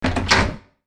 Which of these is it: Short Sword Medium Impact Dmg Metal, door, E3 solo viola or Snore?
door